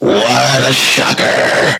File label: Game Over